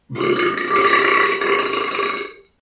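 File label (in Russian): отрыжка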